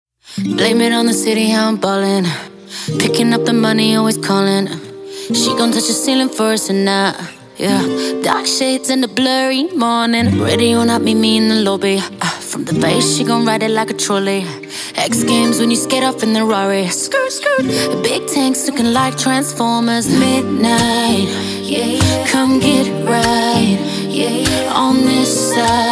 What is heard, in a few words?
Big (Acoustic)